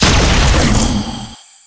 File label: assault gun shoot; tf2classic